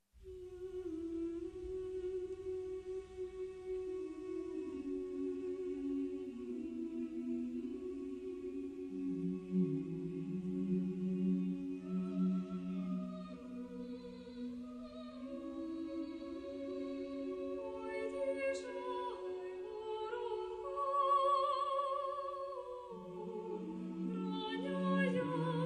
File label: Ukrainian song; modern